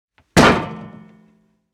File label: metal hatch squeak open quickly close